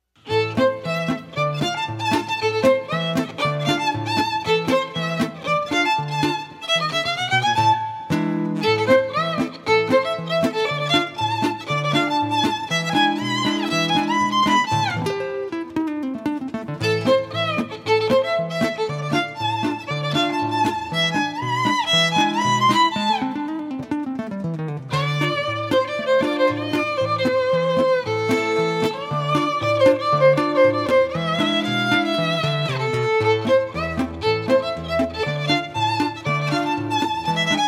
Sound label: Violin and Guitar Jazz; Edgar Gabriel; Solo Violinist Duos Small Band